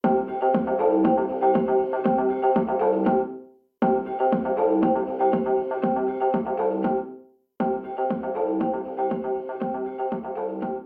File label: Gramophone